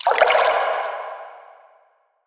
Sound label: Random Water Splash; moul